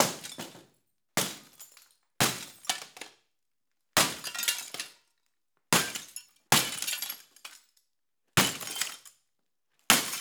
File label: Window Frame Hit With Sledgehammer Movement Debris Wood Break; The Soundcatcher; The Glas Smash Sessions